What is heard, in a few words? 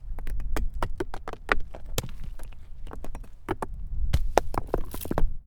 Falling Rolling Pebbles